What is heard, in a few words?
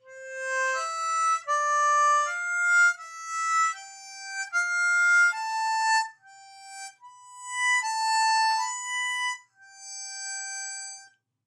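C Major Couplets Legato, harmonica; The animals went in two by two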